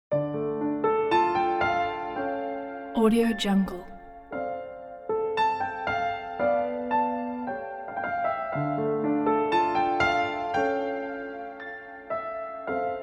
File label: sad ambient piano